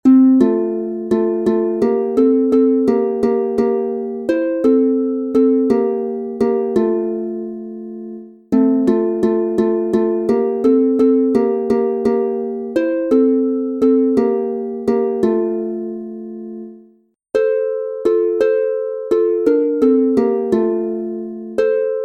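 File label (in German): F Dur 2 stimmig instrumental, Harfe; 15 Kinderlieder 2; Und wer im Januar geboren ist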